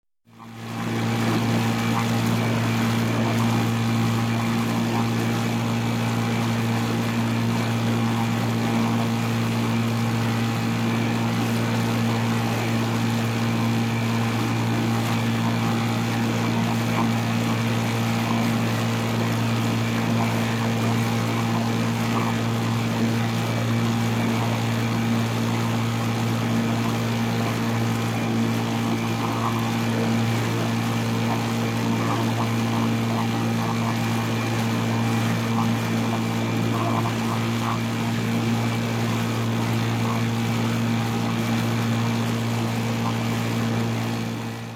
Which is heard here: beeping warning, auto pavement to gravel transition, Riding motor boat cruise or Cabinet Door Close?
Riding motor boat cruise